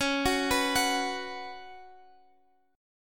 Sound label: F sharp Suspended 4th C sharp